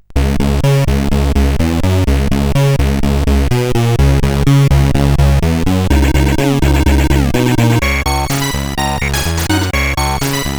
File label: intro, sid CSG8580R5; Crazy Sample II intro